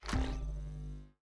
Timer Turn On

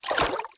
Swimming Splash; MiniGames

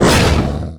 Monster Attack Finisher; Monster Ralph

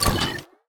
Wires Power On; viewfinder

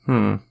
Sad chorus

